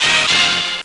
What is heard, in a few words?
f horny